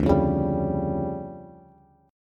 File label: D Minor Major 11th, down, Bass, Bass Standard; DmM11; strummed